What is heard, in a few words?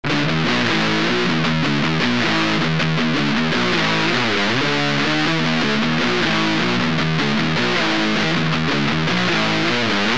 triaxis drive ritm